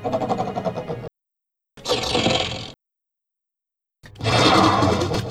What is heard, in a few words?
Wyvern; Guild Wars 2